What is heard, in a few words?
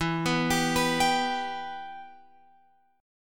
E-Minor, Guitar, Open F; arpeggiated; JGuitar